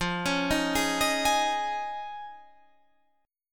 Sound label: F Minor 7th Sharp 5th; arpeggiated